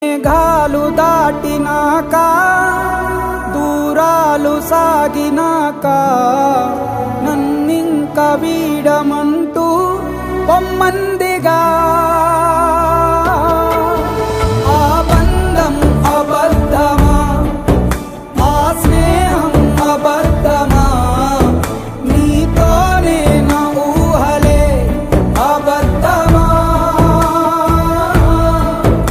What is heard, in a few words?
Ringtone